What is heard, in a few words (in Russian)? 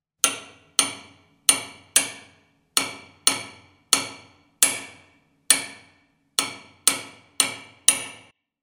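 стук по батарее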